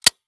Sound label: Industry Tool Staple Gun Shoot Staple